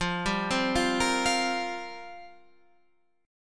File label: F Suspended 2nd Suspended 4th F down Guitar Standard; Fsus2sus4; arpeggiated; capo=3